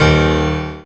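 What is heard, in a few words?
piano A#, FLUEGEL BASS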